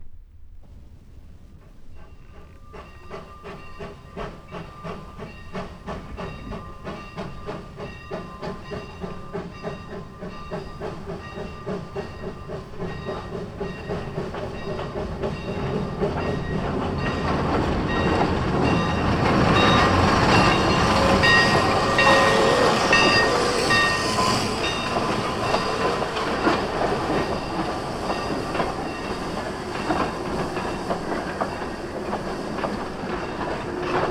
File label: Steam Railroading Under Thundering Skies